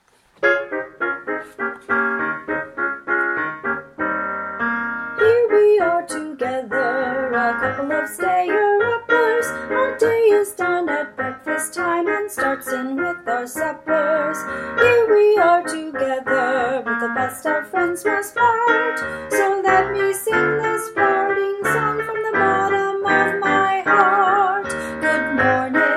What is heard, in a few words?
Good Morning 2 pitches w accomp